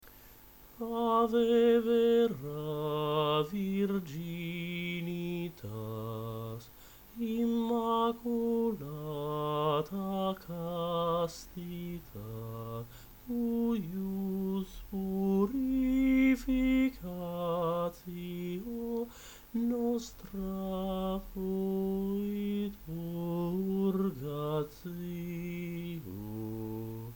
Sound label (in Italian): bassi; ave vera virginitas; desprez